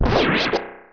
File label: Enemy Killed Reverb; Open Zelda; Quest Designer 2.0.4b; Damage